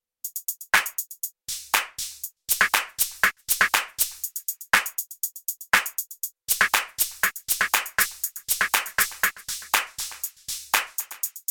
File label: No Kick; KOPRO+